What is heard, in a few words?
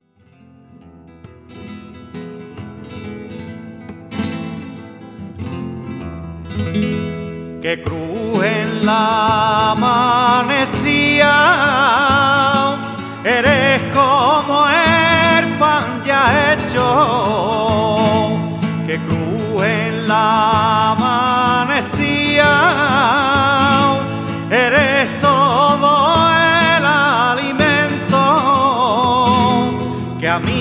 Fandango; de Santa Eulalia